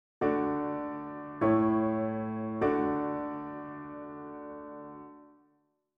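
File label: D major PAC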